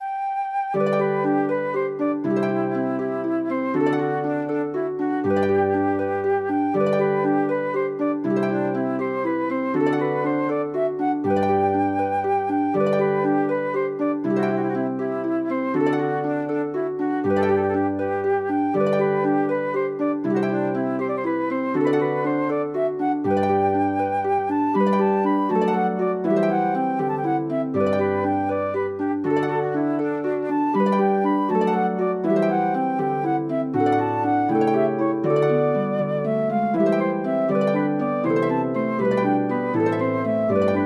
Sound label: for flute harp; planxty maggie browne